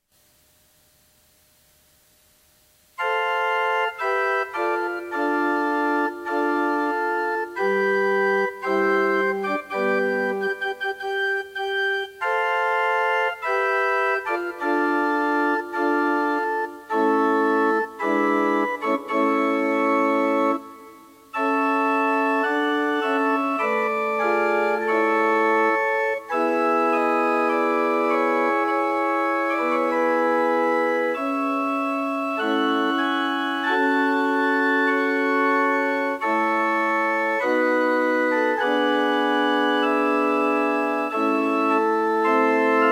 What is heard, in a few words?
Bridal Chorus